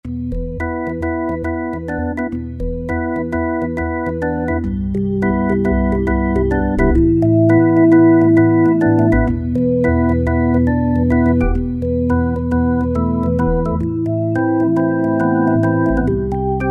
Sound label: Deep Organ, Roland SR-JV80-19 House Collection